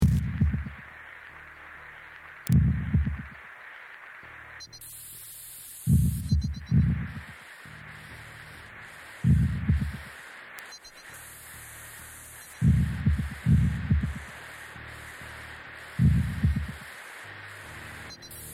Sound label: Heartbeat and Lungs